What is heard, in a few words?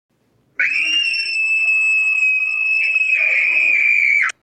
Kid Screaming